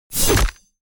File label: Centaur Attack